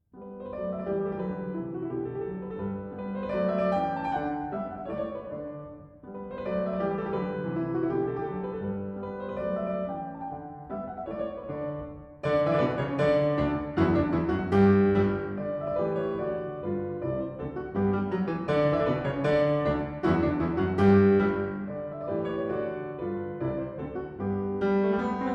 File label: Piano Sonata G, Vivace; No. 25; Op. 79; Beethoven